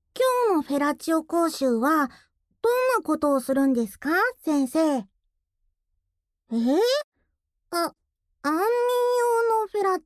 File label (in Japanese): 安眠用フェラチオループ